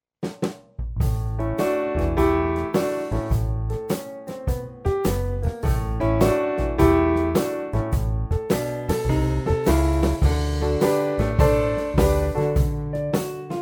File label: Happy blues, piano; Jazz